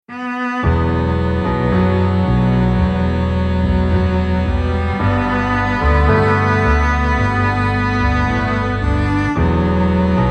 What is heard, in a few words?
CELLO CHILL